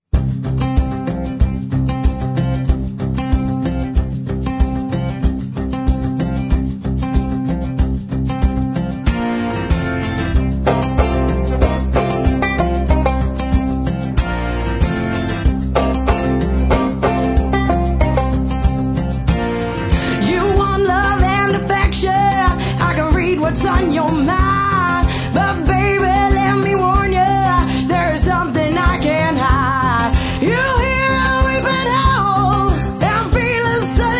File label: Acoustic, Rock